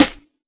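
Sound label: Snare; Classic Hip Hop Mega Kit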